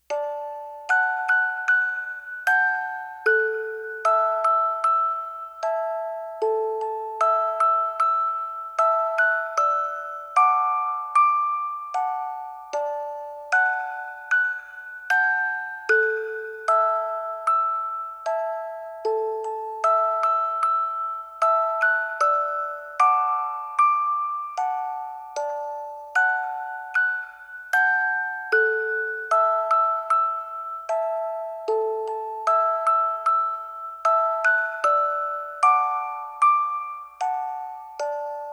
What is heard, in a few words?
music box version; Hush Little Baby; Tiny Tunes; Narrative Mind